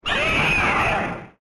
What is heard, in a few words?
roar; Gundarguirus